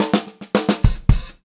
POP FILL, Pop-Rock Drumloops; 108 POP

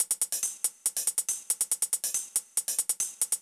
Beat - Hats & Tamb, Grime, 140bpm; Zero-G Sound Sense Grime Scene; GS Construction Kits; Just Flex

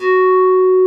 BASSVOX; Masterbits; Vintage Voltage; SQ+FX

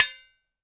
HANDY PERCUSSION

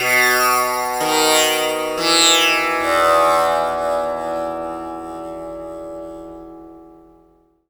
TAMPURA; Spectrasonics; Heart of Asia

